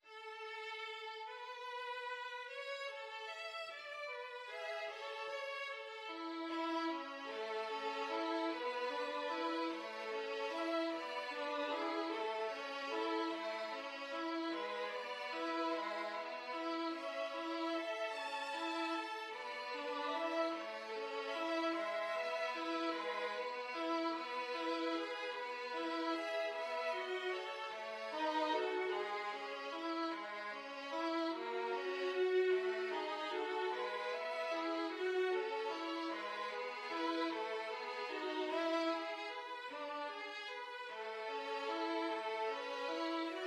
violin duet

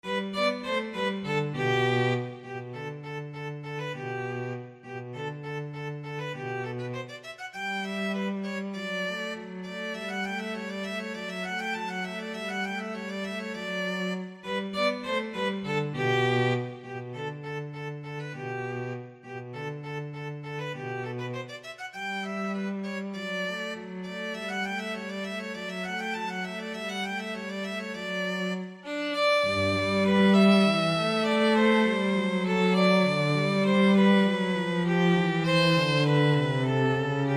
violin cello duet

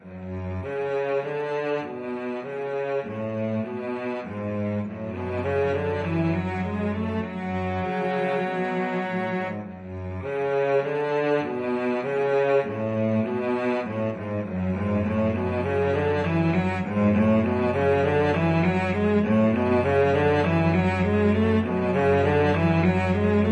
submarine warfare cello duet

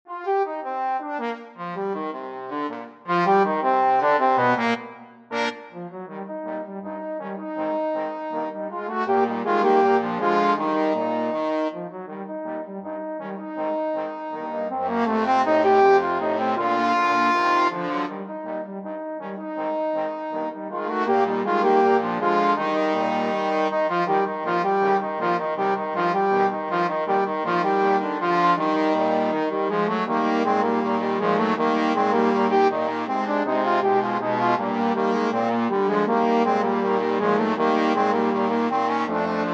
the entertainer, trombone quartet

